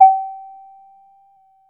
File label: D#1, A BASS; CMP - Analogon